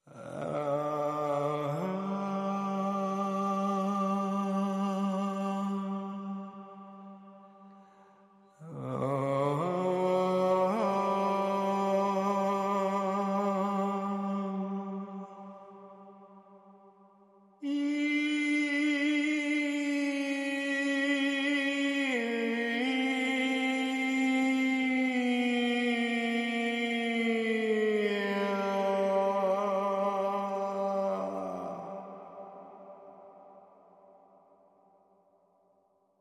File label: Vox